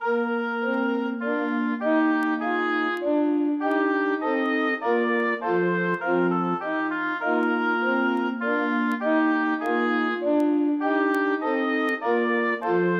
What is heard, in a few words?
jerusalem sop; Cornelius, Peter